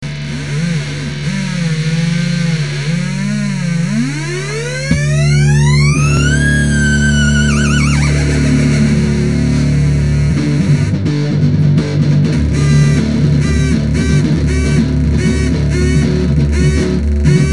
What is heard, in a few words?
big muff and beep it